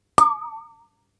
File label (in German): Wasserflasche